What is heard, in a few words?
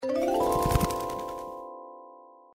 bird fly in; Formosan Birds